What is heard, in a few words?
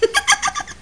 giggle; Funhouse of Love